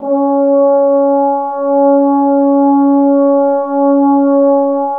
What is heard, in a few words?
trombones, C#3; Best Service ProSamples vol.51 - Classic Orchestra 2